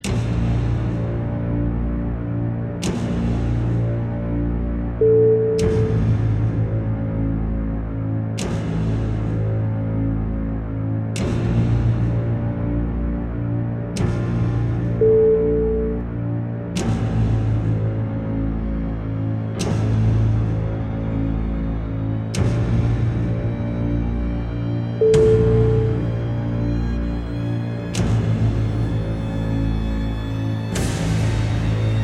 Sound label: horror nightmare; halloween